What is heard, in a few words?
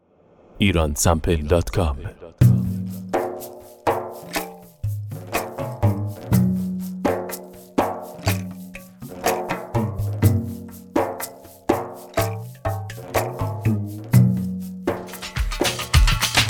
turkish loops